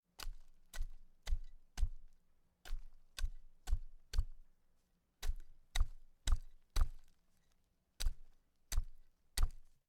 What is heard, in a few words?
tamping soil